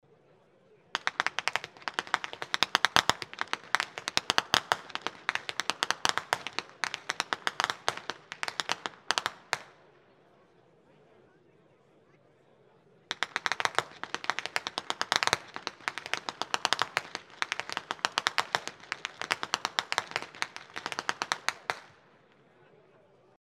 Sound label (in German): schnalzen; rupertigau